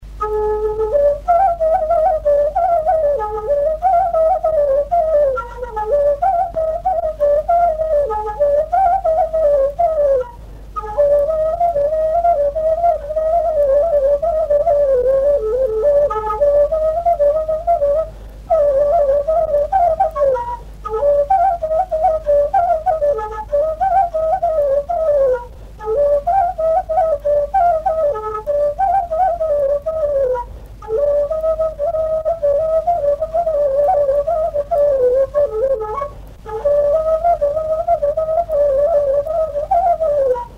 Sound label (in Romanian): Sarba la piculina; jocuri si cantece batranesti; Vaslui